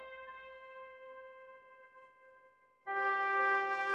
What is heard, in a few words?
victory fanfare; sound effects